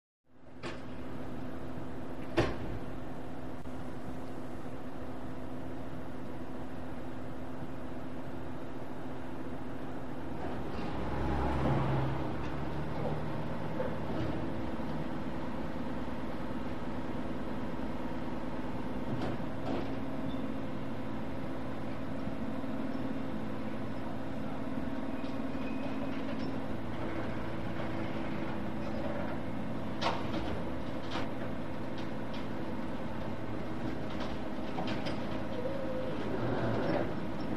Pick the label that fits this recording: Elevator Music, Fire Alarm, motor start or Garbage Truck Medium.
Garbage Truck Medium